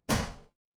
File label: impact slam